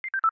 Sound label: Alert Disconnected Low Latency Haptic; Spartan; UI